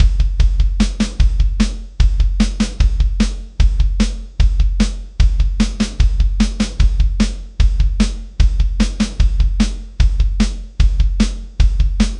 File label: military slow sad drums